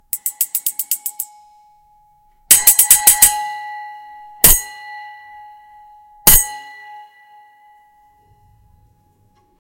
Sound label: impacts